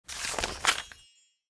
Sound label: research success; Rust